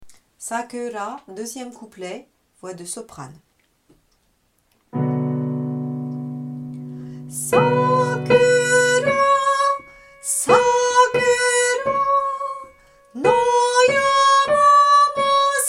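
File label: sakura couplet 2 soprano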